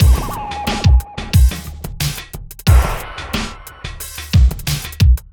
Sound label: Beat, Drums & Percussion; Electrocution; Beats